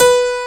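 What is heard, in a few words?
piano attack B4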